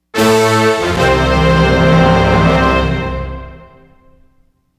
Grow String; Tornado Outbreak

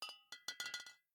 Bottle Shake; The Town

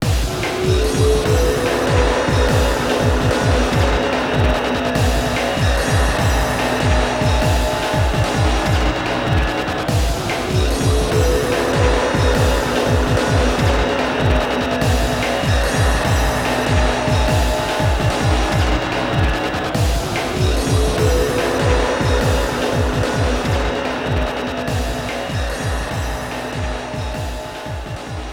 STAGE LAB bgm; Street Fighter IV

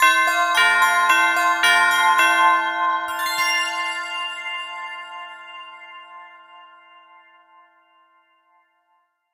Bell; Kirby's Extra Epic Yarn

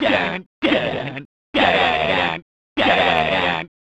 Victory Laugh; Beavis and Butthead; SNES

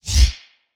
Whoosh; Portal Quest; android; SFX Shield Minion Attack Whoosh 2

